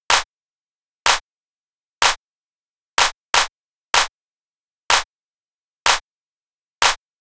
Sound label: Steppin Beat N